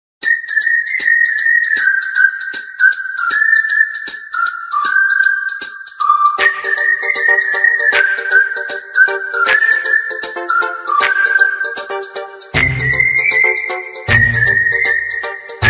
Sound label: Whistlesong